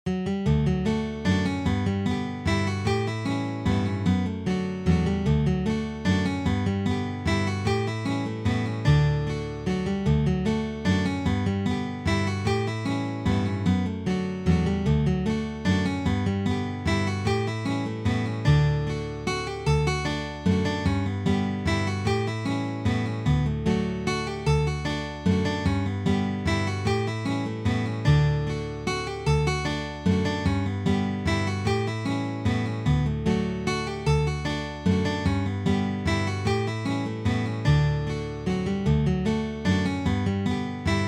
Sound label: Mazurka (duet); John Doherty's Mazurka